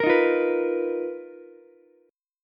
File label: B Major 7th Flat 5th B down Ukulele; BM7b5; strummed; JGuitar